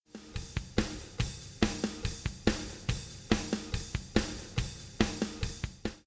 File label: rock